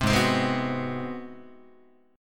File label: G sharp 7th Sharp 9th, Guitar; strummed; 3,4,3,6,3,5; 4A,4E,5A,5D,5G#,6C#; JGuitar